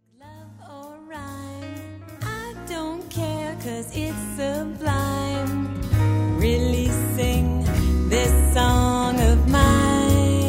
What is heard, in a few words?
laugh hi